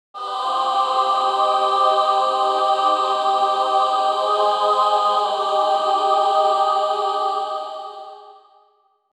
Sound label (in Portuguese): cantando no estilo coral; 360